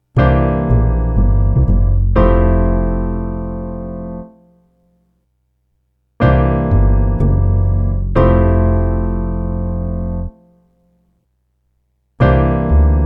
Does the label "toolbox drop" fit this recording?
No